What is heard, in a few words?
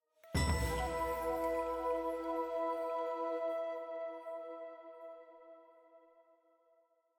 item Jingle Full + Choir Flute E